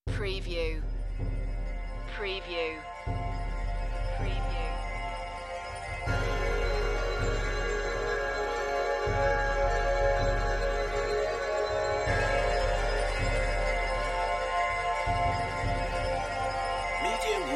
Trailer Production Elements - Texture - Shimmer, Airy, Drums, Shifting